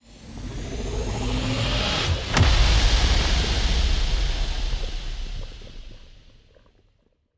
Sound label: toxic megastructure hit, weapons; Stellaris; toxoids